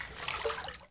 tread; ambient; Shadow Man

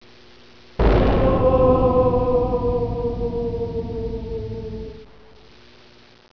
spooky

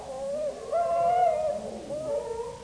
DOG

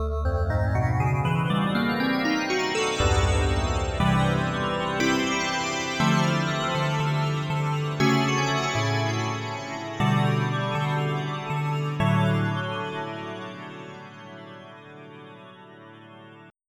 Ice Rain; FF7

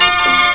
horns; Art Game 2.1nv

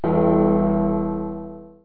bell

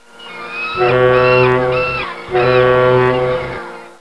ferry

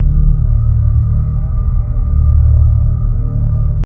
Bass; alone in the dark 4

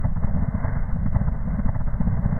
snow surface; xmr

